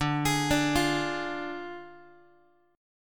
D Diminished Guitar, chord; x,10,11,12,9,x; 4A,4E,5A,5D,5G#,6C#; arpeggiated

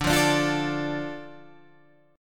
D Minor D Guitar Open G

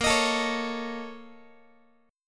Bb Minor Major 11th, Guitar; strummed; x,18,18,19,17,x; 4A,4E,5A,5D,5G#,6C#

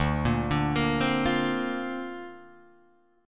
D-Minor 7th Sharp 5th D Guitar DADGAD, chord

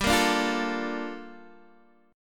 G Minor 9th Guitar DADGAD

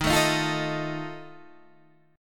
Eb Suspended 2nd Flat 5th Eb Guitar Standard; Ebsus2b5 strummed; JGuitar

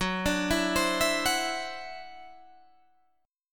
E 7th Suspended 2nd Sharp 5th Gb, guitar

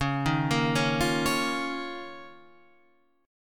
D sharp 7th Suspended 2nd C sharp

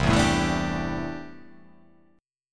C 6th Add 9th C down

